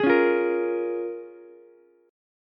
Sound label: C sharp 6th C sharp down Ukulele; strummed; C#6; JGuitar